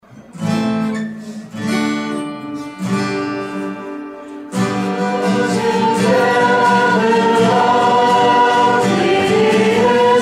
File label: Singing